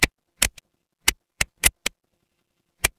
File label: ui typing